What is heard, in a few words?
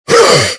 lizard hit